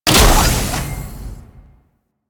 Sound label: Ship Rescued Lock Open; Ambience; Solarian; Warframe; Corpus Ship Remaster